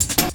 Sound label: Pickup, Drums & Percussion; Percussion Fills; FX; Sonic Foundry (Sony Creative Software); Futurist Drum & Bass